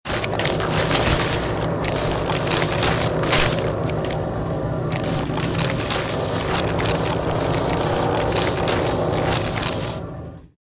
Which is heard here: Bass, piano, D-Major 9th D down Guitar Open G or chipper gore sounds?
chipper gore sounds